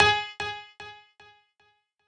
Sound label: piano